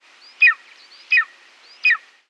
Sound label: eagle